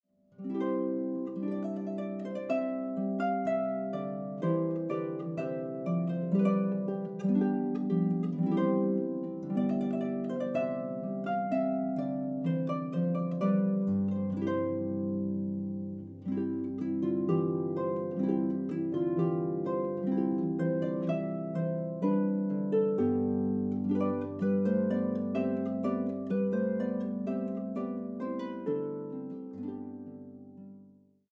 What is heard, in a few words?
Trumpet Voluntary, harp